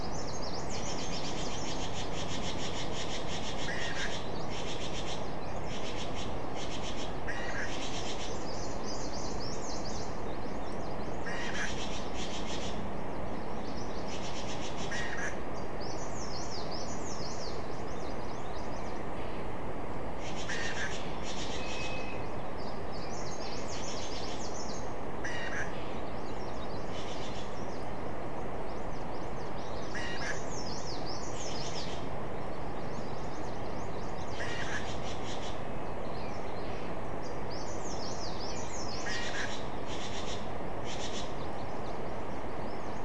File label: mainly bird cluster